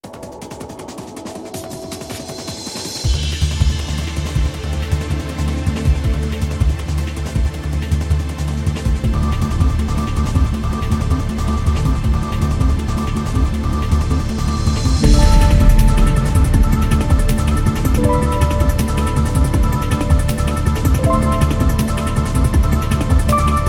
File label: bgm battle water; final fantasy xv 2016